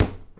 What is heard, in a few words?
Street Ball Hit Pad; SFX Kit